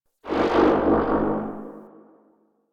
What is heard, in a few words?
Damage Upgrade Loop; Orbs; Upgrade Drops